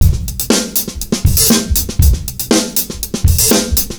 R&B Drums; Sonic Foundry (Sony Creative Software); Live n Ruff

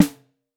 snare organic

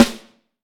CHT, Vintage Snare Drum; Q Up Arts; Johnny.C's Vintage Snare Drum; 5.5X14 SLR

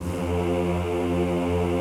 F1 L, Classical Choir; Best Service; Extended Classical Choir

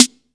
HOUSE DRUM SD; AKAI S6000 CD-ROM - Volume 1; DRUM RYTHM

